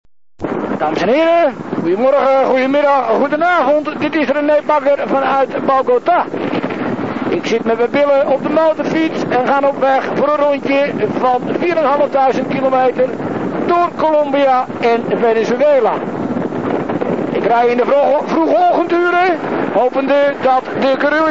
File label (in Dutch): motor